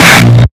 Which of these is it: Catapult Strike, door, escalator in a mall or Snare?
Snare